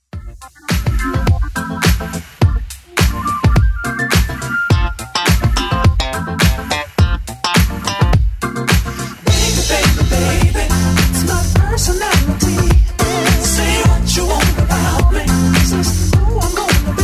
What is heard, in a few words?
80s Club Edit 105bpm